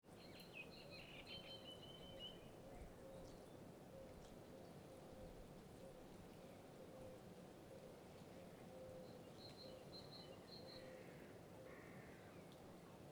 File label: country birds crows flies roos; atmosphere